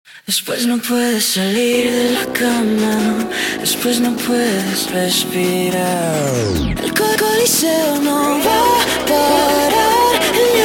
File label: sped Up